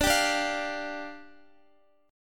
D Minor Double Flat 5th, down, Guitar; Dmbb5; strummed

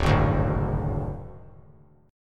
D Major Flat 5th D, chord; strummed; JGuitar

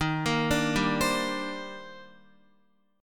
Eb Major 13th Eb down Guitar; EbM13; arpeggiated; JGuitar

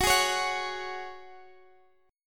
Gb Suspended 2nd, Guitar, Standard; strummed; capo 2; x,x,16,13,14,14

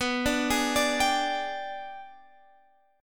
G sharp Minor Major 7th Flat 5th

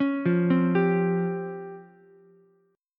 Db Major Sharp 11th Db down Ukulele C6; arpeggiated; chord shape; JGuitar

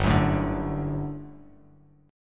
G Major 7th Suspended 2nd, Bass Standard; strummed

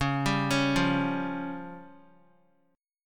C sharp Major Flat 5th, Guitar; arpeggiated; chord; x,4,7,6,5,x; JGuitar

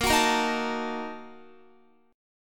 Bb-Major 7th Suspended 4th Sharp 5th, Guitar; strummed

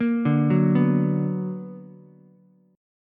B Minor Major 7th, Ukulele; arpeggiated; JGuitar

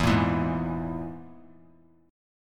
E Major Flat 5th; strummed; JGuitar

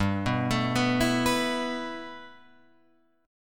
G 6th Add 9th G Guitar Open F, chord; G6add9 arpeggiated

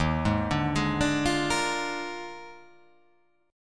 Eb Major 7th Suspended 2nd Suspended 4th Eb; arpeggiated; EbM7sus2sus4

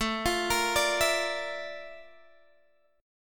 A sharp Major Sharp 11th, chord

